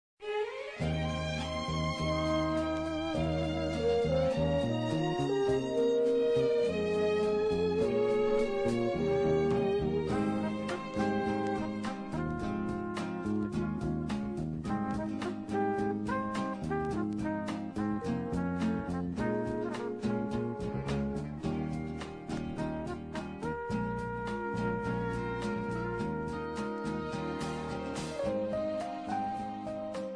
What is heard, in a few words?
Muzak Music